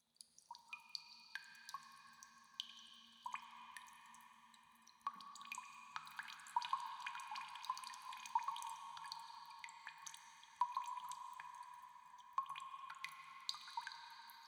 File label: cave water drips